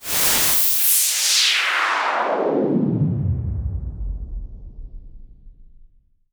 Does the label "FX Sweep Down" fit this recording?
Yes